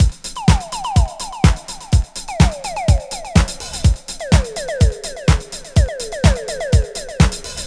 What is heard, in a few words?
disco drum loop; DRUMLOOPS; Best Service ProSamples vol.48 - Disco Fever; AKAI